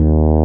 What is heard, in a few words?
Fretless D#1; Proteus 2000; Multisamples; Digital Sound Factory Proteus 2000